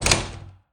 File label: colored lever off; p00gie